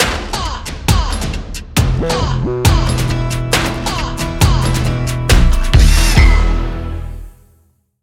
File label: Template 136bpm; Wuerth